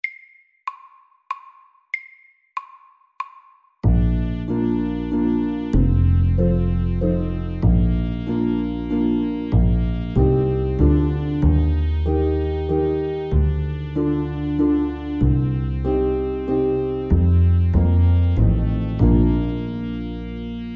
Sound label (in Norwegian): Sommervals MEDIUM 95